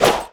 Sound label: sword swing, weapons; tf2classic